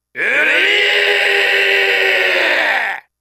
wryyy; cave

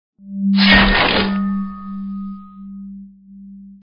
attack ping; vapesquared

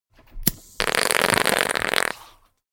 bong

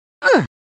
ungh

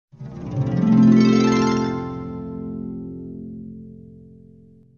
harp; cave

